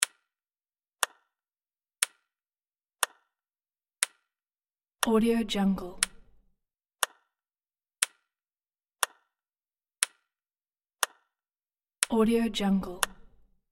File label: clockwork clock ticking catch release room